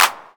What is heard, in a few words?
CLAP; SoundFactory